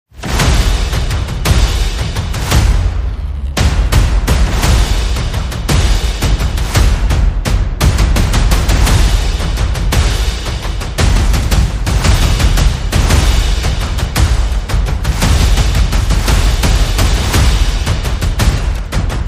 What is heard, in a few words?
Percussion and Effects